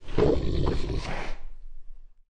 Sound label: beast idle; morass beta; morassbeast